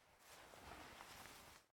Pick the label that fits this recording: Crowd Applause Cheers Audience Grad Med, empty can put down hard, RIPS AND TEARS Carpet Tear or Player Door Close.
Player Door Close